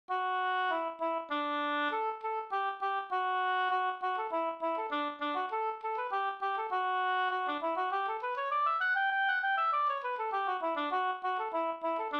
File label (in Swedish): slangpolska